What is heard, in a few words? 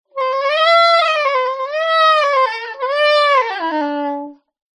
Sax Expressive